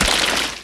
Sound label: mined, mining; cuttlepop; Factorio